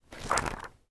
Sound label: Pause flip; Phasmophobia